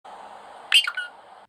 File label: Peekaboo bird